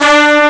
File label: string; lemmings; Amiga Soundtracker Sample Packs